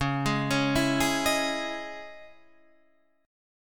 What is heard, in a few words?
C sharp Minor Add 9th C sharp, chord; C#madd9; arpeggiated; JGuitar